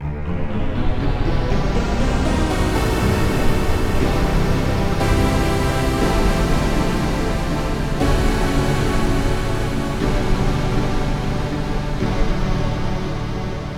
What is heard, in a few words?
X-Strings; SoundFont - Traditional, Voice & Keys - Volume 2